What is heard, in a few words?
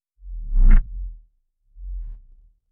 lowpoly game sfx gravity